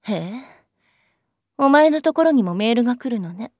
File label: Notify; Mayuri Black Set B